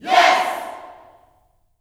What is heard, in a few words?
"Yes" yell shout, gospel; Spectrasonics; Vocal Planet Vol.1 Gospel; akai